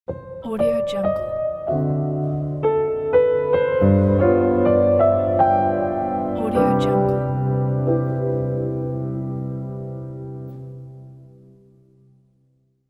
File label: jazz piano logo